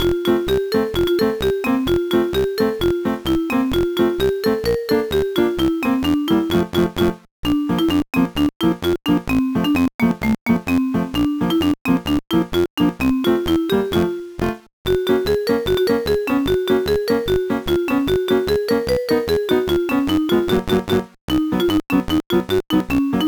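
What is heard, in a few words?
background music; No No No Puzzle Chailien; GBA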